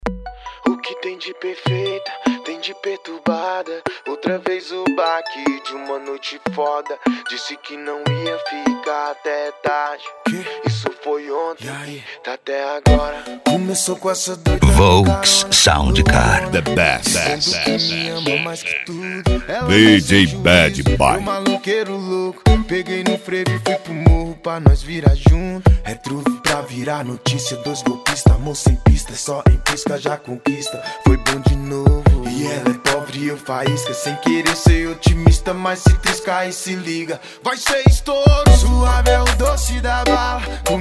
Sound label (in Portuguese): sound car hip hop nacional; cd volks sound car